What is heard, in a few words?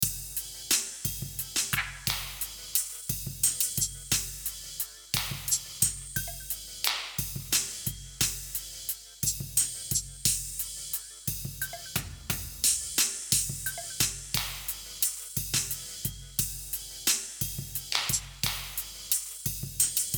drums